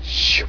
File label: Shwoom; Concentroids From Planet X